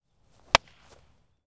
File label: hit, Ball Bat Glove, baseball